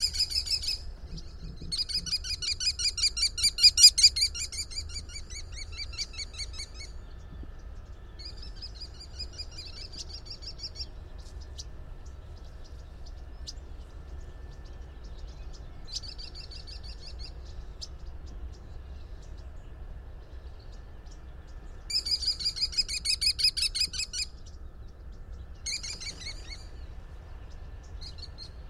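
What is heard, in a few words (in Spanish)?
cernícalo americano falco sparverius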